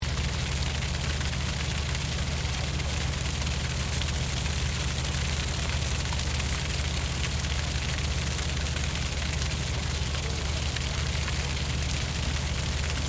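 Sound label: Boat Custom Restaurant Boat Bilge Pump Output; large resteraunt boat; Boats; Vehicles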